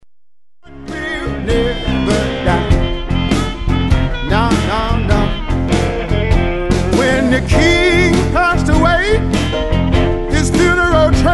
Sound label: Blues